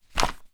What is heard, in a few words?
directory telephone paper page turn; foley